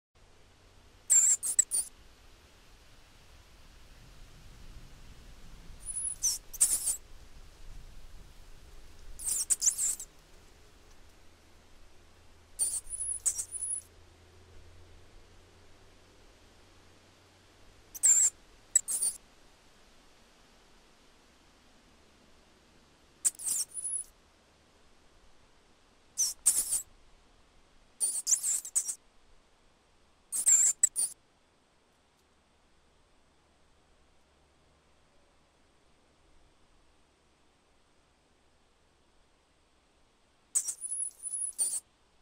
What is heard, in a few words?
Rat Sound; animal